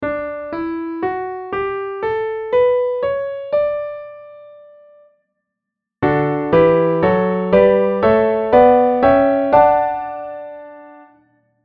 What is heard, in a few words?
D major diatonic